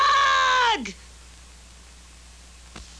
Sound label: aauugghh